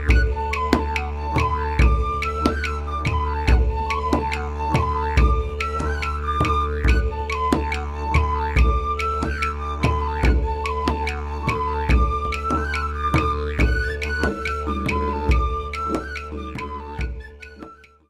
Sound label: Kerry Polka